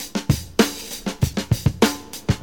131 bpm modern drum groove g key

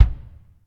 boomy kick drum single shot c key

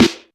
good snare drum sound a sharp key; snare drum samples

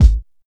deep kick single hit g sharp key; kick drum samples

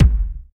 bass drum single hit g sharp key; kick drum samples

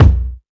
boomy bass drum single shot b key; kick drum samples

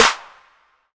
perfect acoustic snare A key

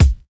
trebly bass drum one shot c key

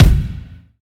clicky kick one shot E key; kick drum samples

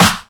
trebly acoustic snare sound F sharp key

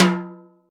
verby tom f sharp key; tom drum samples; one shots

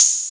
clean open high hat sound g sharp key; open hat samples

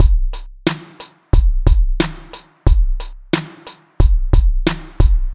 90 bpm drum groove c key

